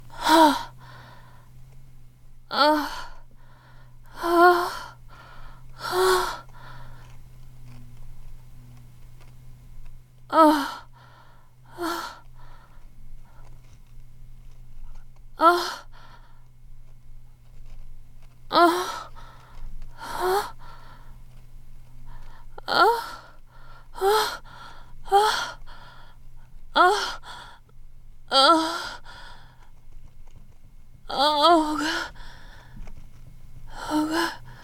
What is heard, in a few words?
Just Moans